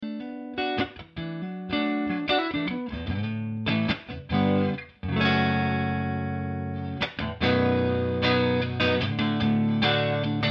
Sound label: clean